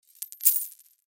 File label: Coin Drop Cement Multiple; Coin Toss; Foley; Money; Blastwave